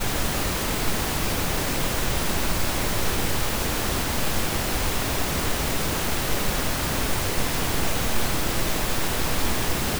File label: pink noise